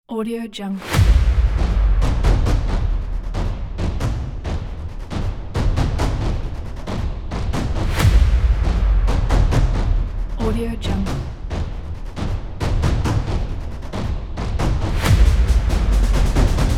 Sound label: military percussion